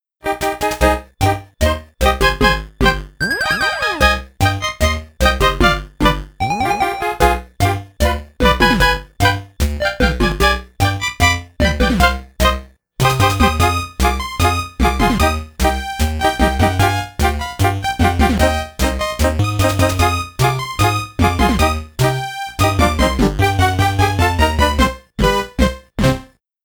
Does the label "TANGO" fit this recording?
Yes